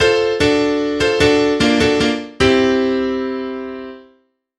Fanfare

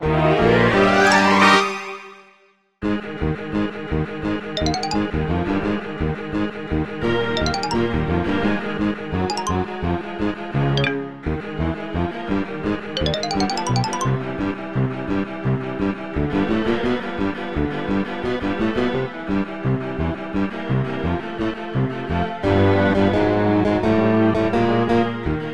Orchestral Karaoke

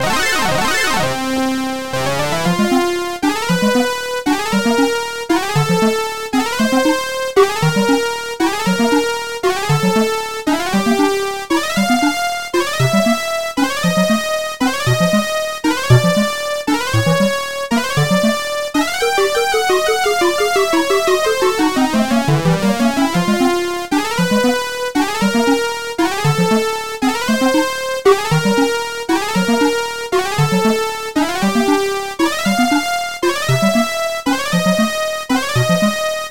Battle Music

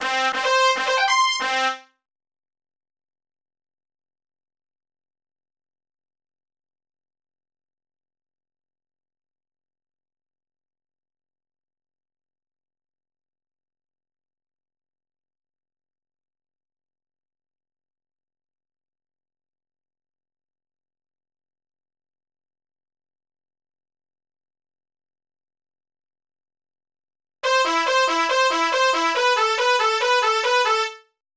level up

